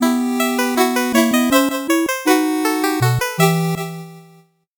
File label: 8bit jingle slow